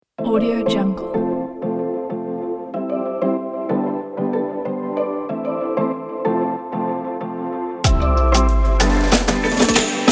summer pop vibe

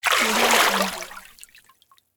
water movement

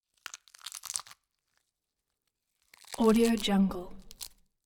gore snap; collanderson

